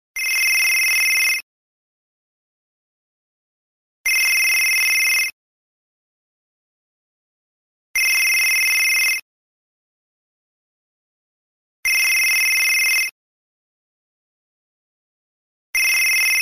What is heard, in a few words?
ring nextel ringtone